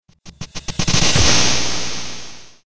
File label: studder stab